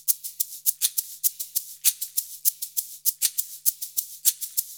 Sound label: Modern Percussion Loops; Soundscan vol.56; AKAI